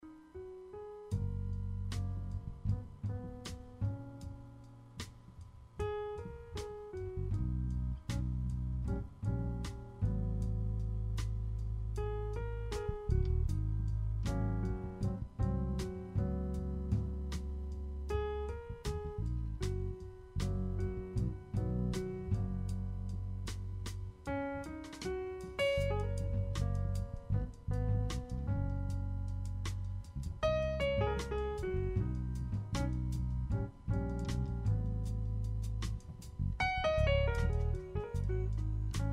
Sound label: jazz